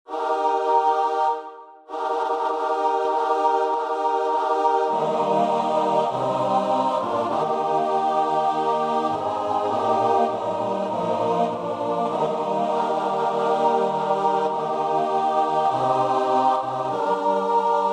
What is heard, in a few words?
god of the ages CHOIR